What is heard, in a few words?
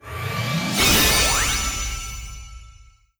Skill Levelup Max